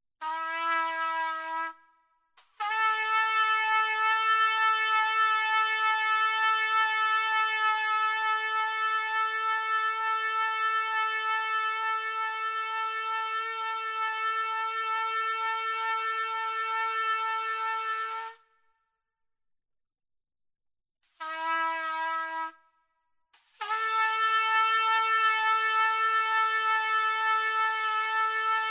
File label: Trumpet Loop